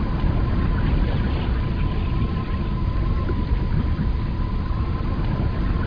BACKGRND; quake

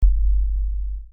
Sub Bass; instrumentos; baixos; Virus

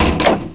button, buttons; Hexen2

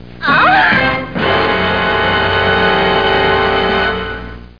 dunt dunt

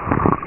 Slurpy; Realmz

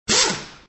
Sound Point; aventura

